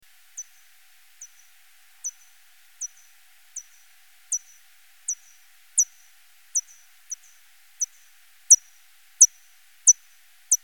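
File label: Vespadelus pumilus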